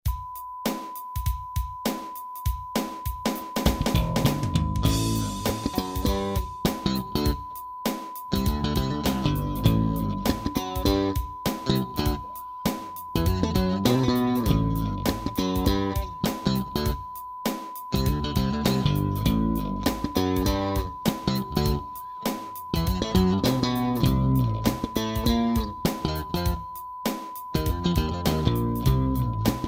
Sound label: Bassman Sound Works